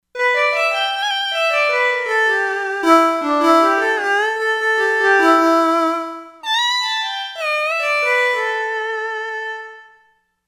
WarmLead

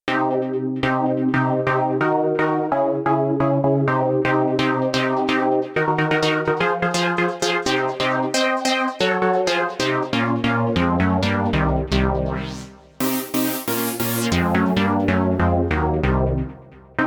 90s House Chrd, Korg Radias-R

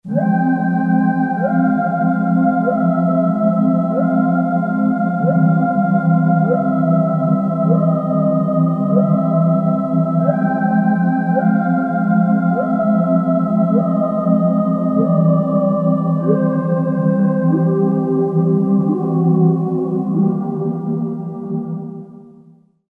Voices, Korg DSS-1

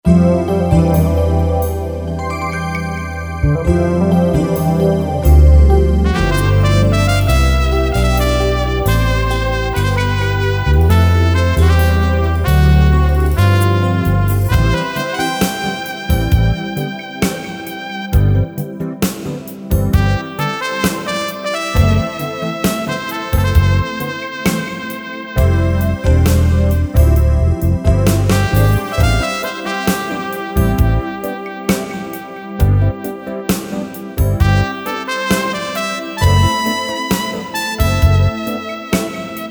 Sweet Trumpet, Yamaha PSR-550; Factory demos

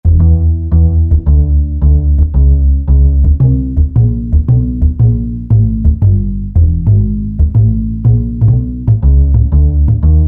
Upright Bass; Sonic Reality Modern Songwriter

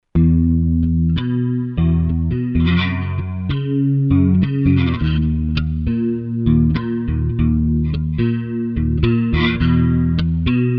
PICKED CHORUS, Zoom 506 II

